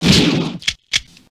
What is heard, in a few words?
shotgun; war zone